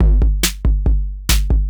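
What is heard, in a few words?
DRUMLOOP ELECTRO 140; Techno2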